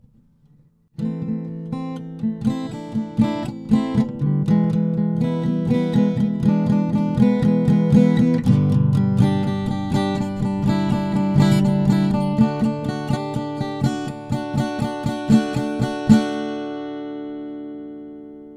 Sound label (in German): royer r-122 mkii gitarre vorderseite 10 cm schallloch